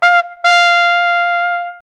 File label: salsa trumpets; latin